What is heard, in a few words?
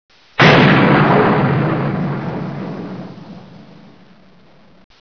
thunder